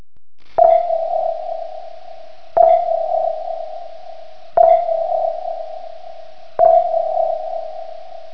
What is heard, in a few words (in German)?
uboot echolot